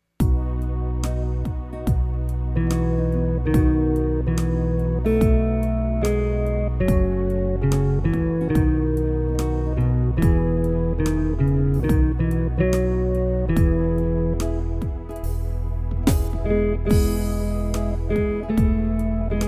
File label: Instrumental bakgrund